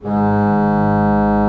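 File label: Pedal G#1 Left, church organ; Best Service; Advanced Media Trax Modern Composer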